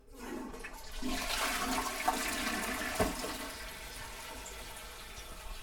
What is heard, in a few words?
toilet